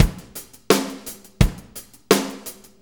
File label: 4-4 Loop, Drum Loops, Full Kit Loops